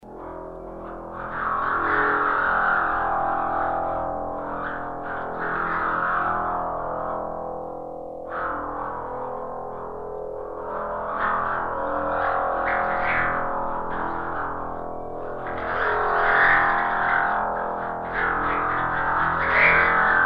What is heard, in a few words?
medium wind